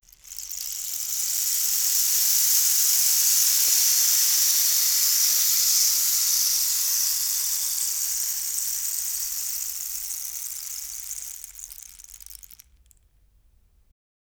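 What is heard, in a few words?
rain stick; Univers Sons - Raricussions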